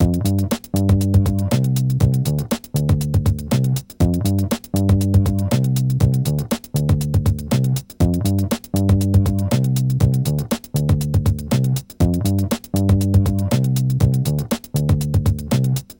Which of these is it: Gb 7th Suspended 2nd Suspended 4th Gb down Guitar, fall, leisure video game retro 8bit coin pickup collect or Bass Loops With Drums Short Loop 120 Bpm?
Bass Loops With Drums Short Loop 120 Bpm